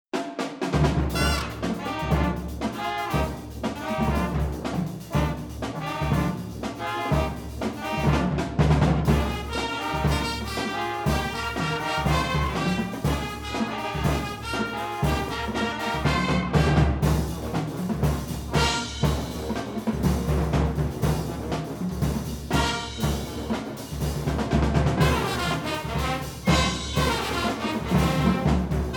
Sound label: Funk, pepband